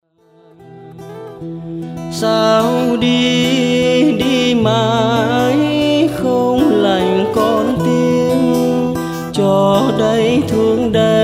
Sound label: guitar acoustic bolero